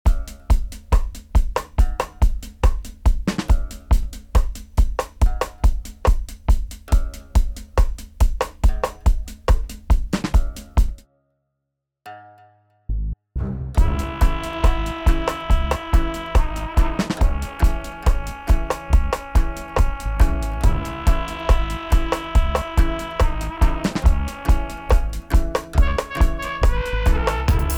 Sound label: 140BPM, beats dance